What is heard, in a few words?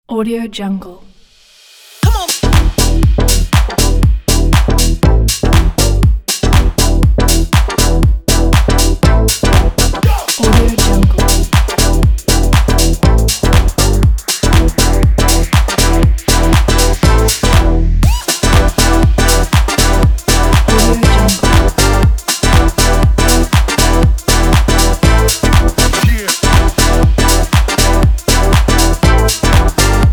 deep house fashion